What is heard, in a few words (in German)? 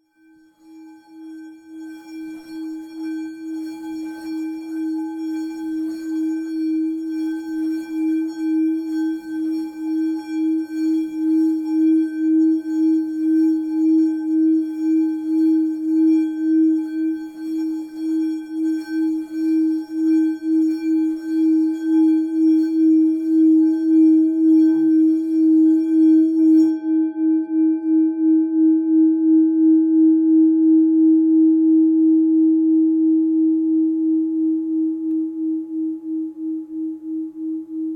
Klangschale Reiben